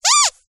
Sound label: toy squeaky squeeze single